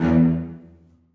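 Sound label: celli staccato d#2; Sonatina Symphonic Orchestra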